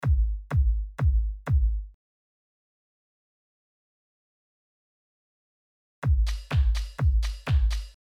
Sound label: drums; freedom